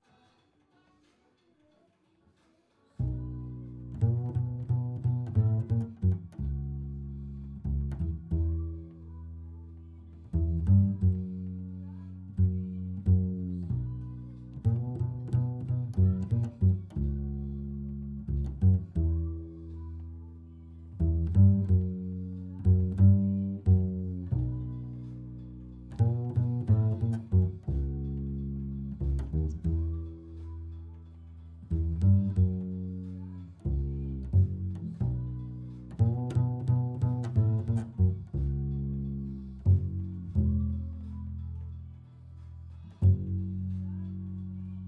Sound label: Bass Mic